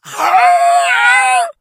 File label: shout, infected; become alert